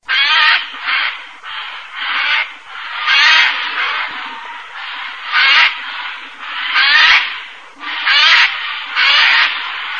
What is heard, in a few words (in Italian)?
ghiandaia